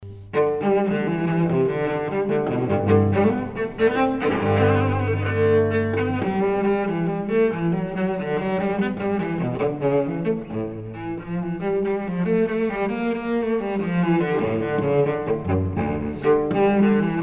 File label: Cello Suite; G Major; Gigue; Johann Sebastian Bach; Cello Suites; BWV I007